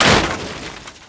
crate; WhiteOut

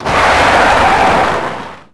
mercedes skid; Max Payne; tenements

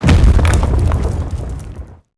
concrete wall falls; Max Payne; tenements

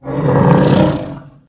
growl, Dino

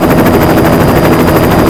chopper; Glenhelen

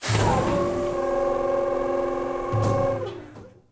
comm Console deactivate; machines mechanical

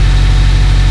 996 rpm1, Engine; Cars; XBOX

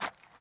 footsteps dirt

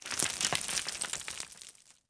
Rockslide Sm; impacts explos

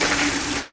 door Stab end; impacts explos; quiGon

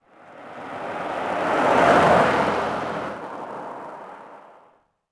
houses car; Tony Hawk's Project 8; XBOX

